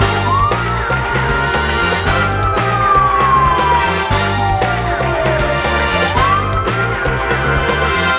big Win Loop; reel steal

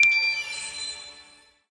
candy Pick; fairyhansel

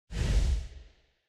wild Walking Random Noise; godsoffortune

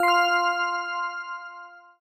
count Up End; spacewars

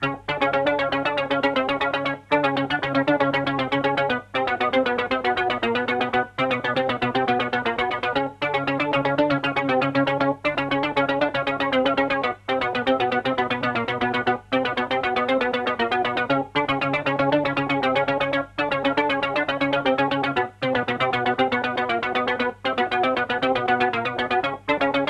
Main Game Arp; knight rider

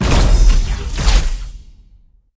wild Landing; mercy of the gods

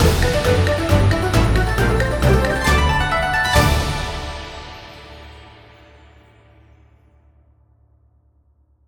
big Win End; imperialriches

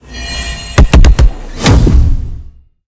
random gorilla; silverback

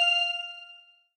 count Up Loop End; hotline

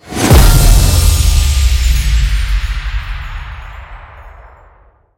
impact; gonzos gold

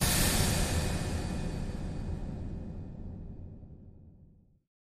big win start; halloweenjack

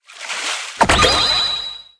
nudges the right reel; koi princess

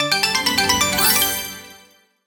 attention Sound; magic maid cafe

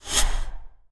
cocoon land sweep; butterflystaxx2

